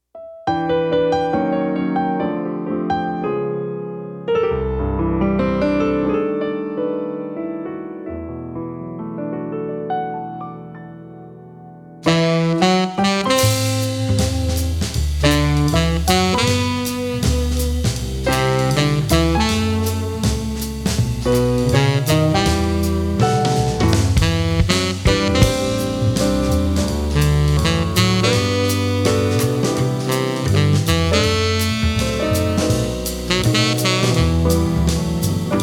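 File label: Jazz Quartet Studio; Gentlemenof Swing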